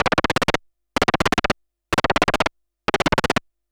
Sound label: SH101 Clocked LFO Rhythm (125BPM)